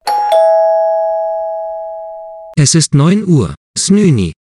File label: dingdong